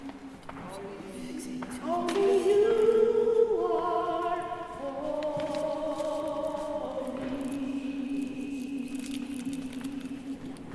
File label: Soprano